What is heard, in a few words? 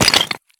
sprint tile; Escape from Tarkov